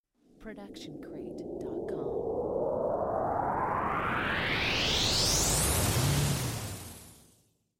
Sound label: Cinematic Riser